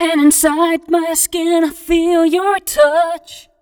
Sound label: f mel d, fem Vocals